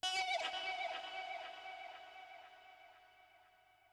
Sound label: chip synth